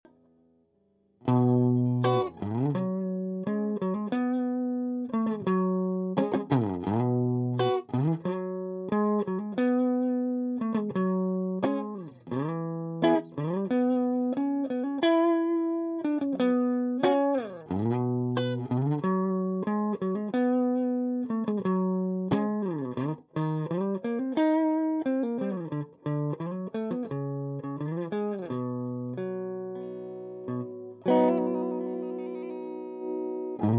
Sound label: strato in B